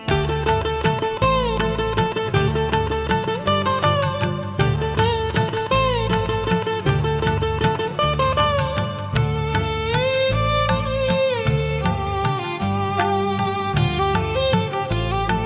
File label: indian melody ringtone